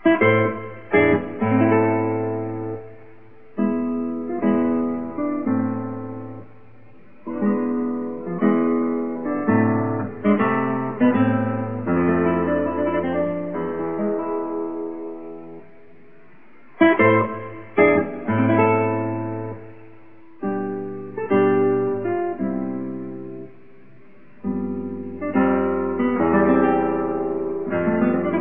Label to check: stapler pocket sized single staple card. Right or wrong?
Wrong